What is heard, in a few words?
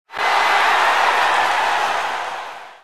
Result Scream